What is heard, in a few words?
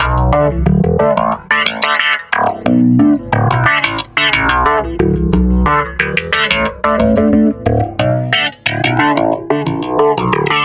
Stick Loop, Stick Bass